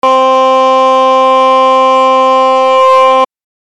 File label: Frog